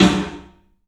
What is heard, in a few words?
PA DEEP L, CLUB SN VRB; 3D Sound; 3D Drums